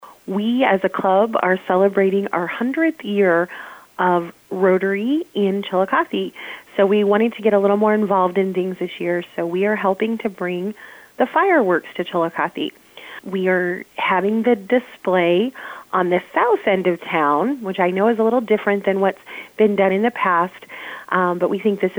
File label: Fireworks